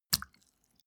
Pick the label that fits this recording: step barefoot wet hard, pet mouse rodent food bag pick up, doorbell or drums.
step barefoot wet hard